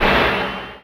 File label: poison tick; weapons; dota; dazzle